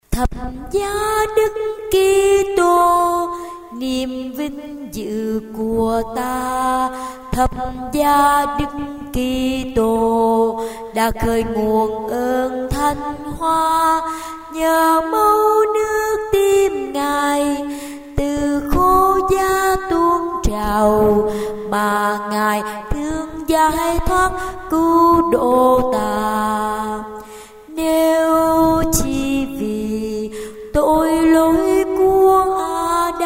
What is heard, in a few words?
alto basso